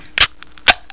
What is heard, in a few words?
open can